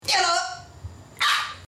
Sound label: climb jodel